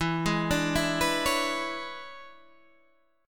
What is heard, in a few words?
E 13th, Guitar, Standard; arpeggiated; JGuitar